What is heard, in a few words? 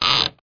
slider